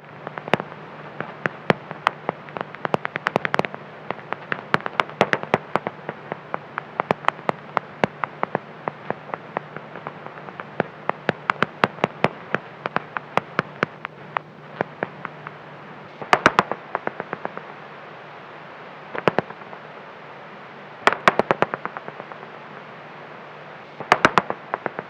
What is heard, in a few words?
Barbastelle Heterodyne 32kHz, bats